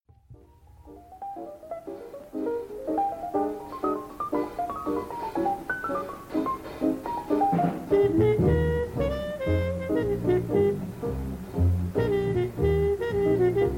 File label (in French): musique